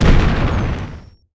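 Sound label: explosion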